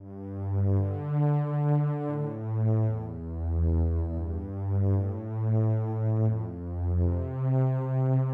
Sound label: slow bass